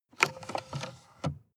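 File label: sports car interior drink holder open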